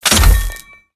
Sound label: Character Body Impacts; Unreal Development Kit